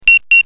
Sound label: ring